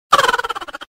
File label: Rat Laugh